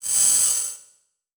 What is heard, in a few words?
Digital; Adobe; Multimedia Internet CD-Rom Flash